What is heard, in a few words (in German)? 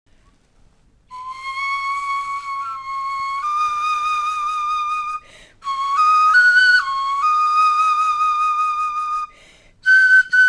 knochenfloete; swr2; wissen